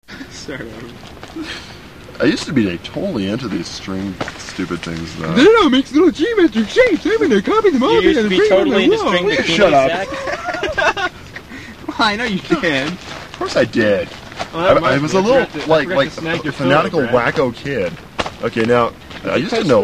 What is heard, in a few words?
Campfire String